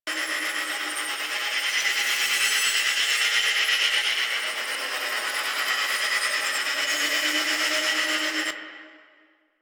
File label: Fairy Move Loop; DE; Warframe